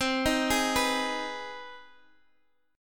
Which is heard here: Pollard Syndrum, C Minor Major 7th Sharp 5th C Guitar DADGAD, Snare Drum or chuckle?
C Minor Major 7th Sharp 5th C Guitar DADGAD